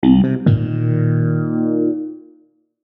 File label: Musical Resonant Clavichord Funky; Keyboards; Blastwave